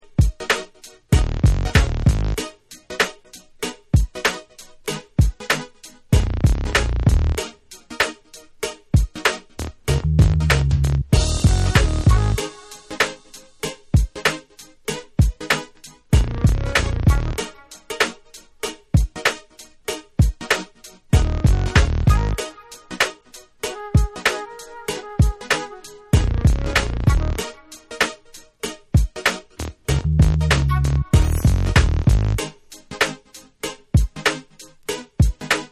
Instrumental

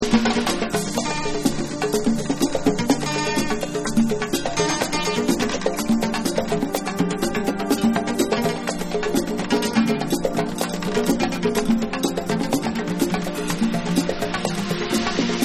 Afro Guitar

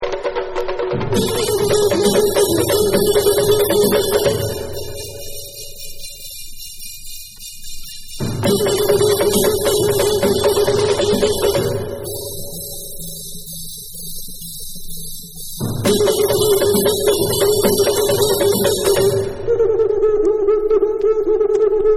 Samba

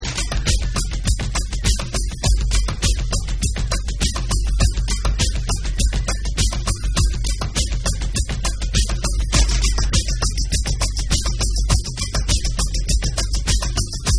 JUNGLE BEATS

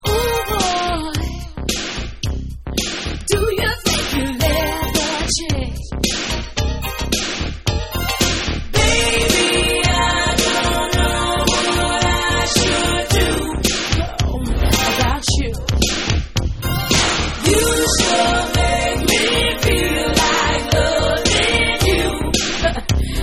Vocal Long Version

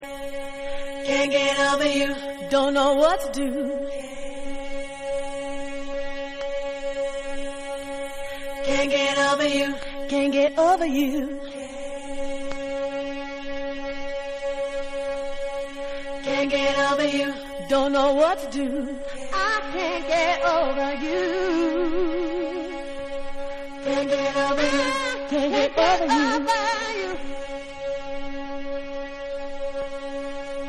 Acapella

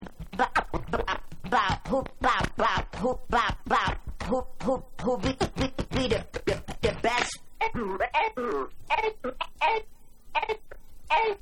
Scratch Apella

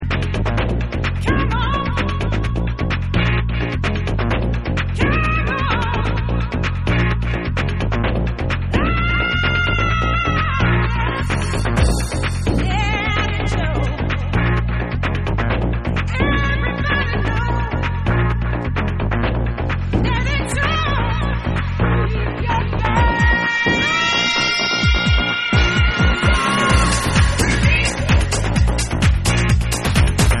Much Drums Acidub Mix

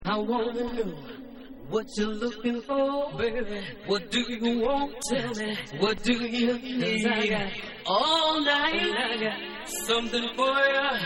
Acapella

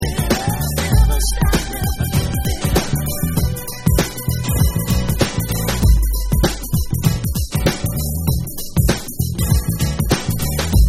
Hard Instrumental

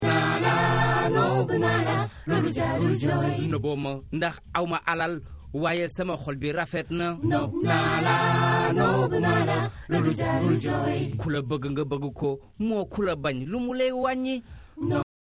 Acappella

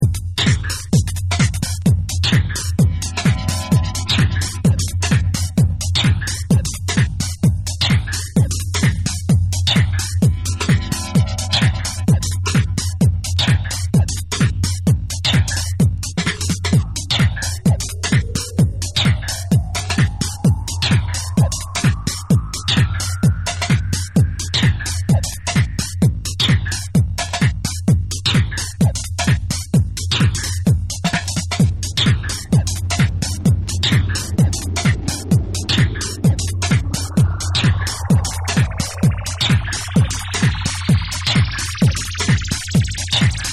JAZZ 2 BEATS

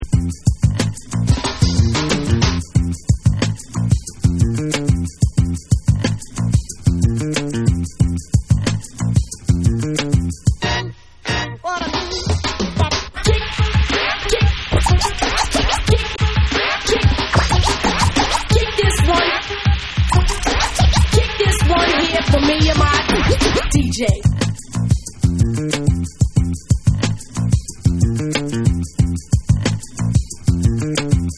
CHA CHA CHA Instrumental

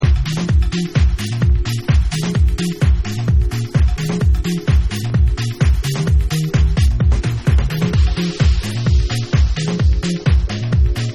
Dub Mix

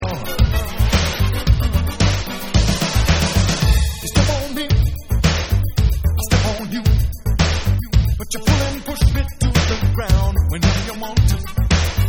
Vocal

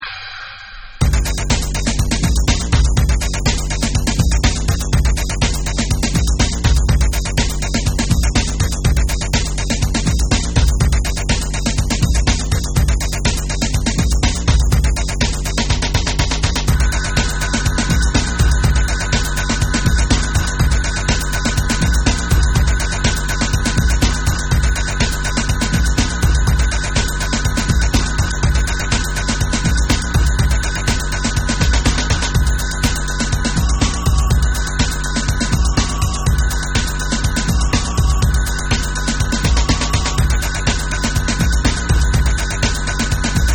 INDUSTRIAL BASS Industrial Mix

